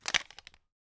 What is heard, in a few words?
movement shotgun; gmod; arccw uc commons attachments